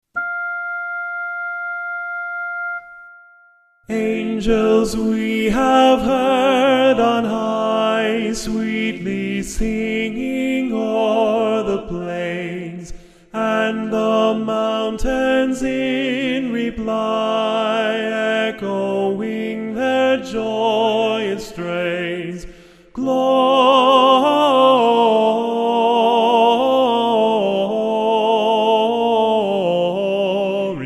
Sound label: angels soprano